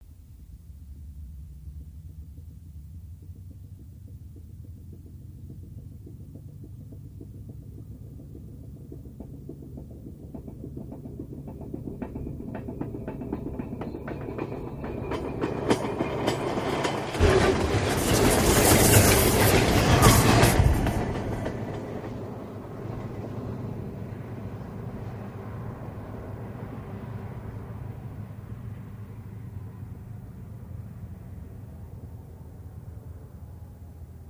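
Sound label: Diesel Locomotive Over Away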